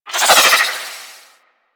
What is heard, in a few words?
Arch Wing Prime Dodge Roll Left; Warframe; Vehicles